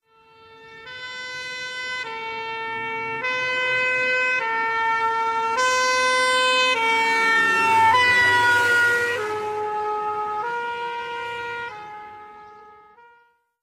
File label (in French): Les pompiers